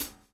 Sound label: DOWN, DOWN KIT 78; AMG Gota Yashiki Groove Activator